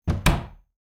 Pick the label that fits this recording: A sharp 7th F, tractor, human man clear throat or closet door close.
closet door close